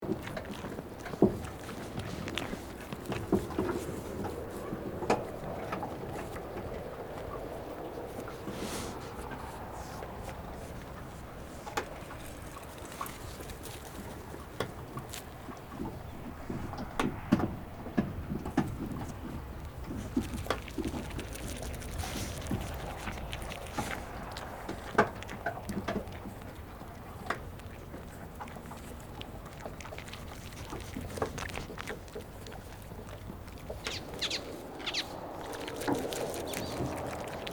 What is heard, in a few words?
Stable animals eating ambience loop